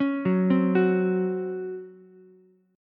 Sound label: Db Major 7th Suspended 4th Db down Ukulele; arpeggiated; JGuitar